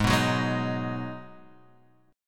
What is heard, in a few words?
Ab Minor 7th, down, Guitar, Standard; strummed